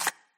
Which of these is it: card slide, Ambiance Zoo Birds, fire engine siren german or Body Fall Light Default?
card slide